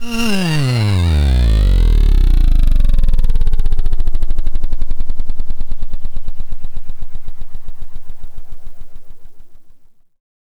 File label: Robot; Sonic Foundry (Sony Creative Software); Underground UK House & Electro